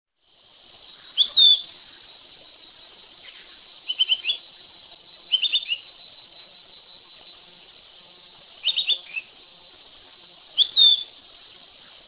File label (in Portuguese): Legatus leucophaius Bem te vi pirata